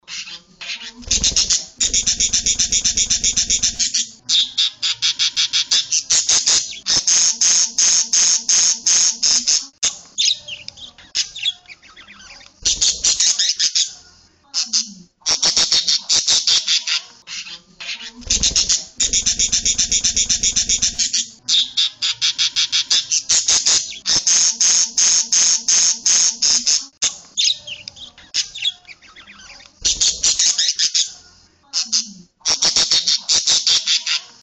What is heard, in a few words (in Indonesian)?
suara masteran parkit